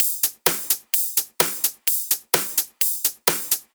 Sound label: Drumloops 128BPM